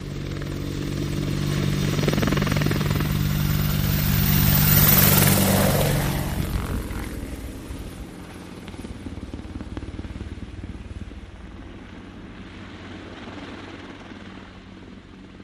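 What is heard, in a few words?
Apache By Medium Fast Left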